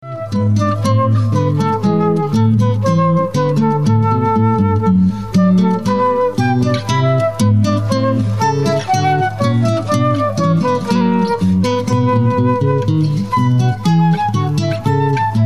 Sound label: Bouree in E minor